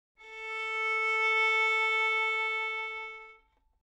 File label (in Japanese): ヴァイオリン, 擦弦; 弦鳴楽器; 開放